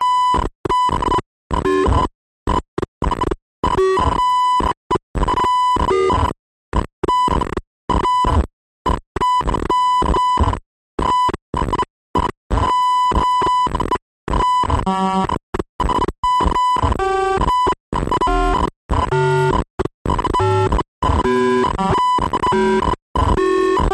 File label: science fiction data telemetry broken signal loop; skyclad sound